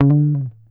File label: GTR TRIK, Jazzistic; Univers Sons